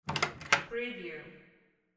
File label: door open